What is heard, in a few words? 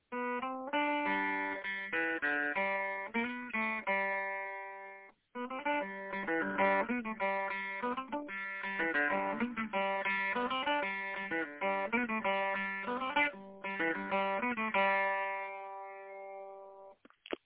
rural norning lick